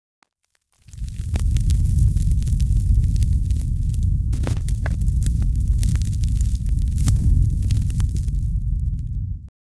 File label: epic cinematic aftermath, camera-shake